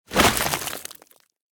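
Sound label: Nu Drum Old Bass Short Rhythm; smite; kuzenbo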